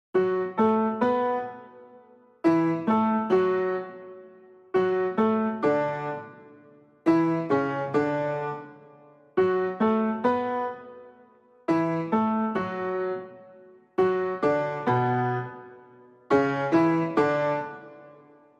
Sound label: Keys Only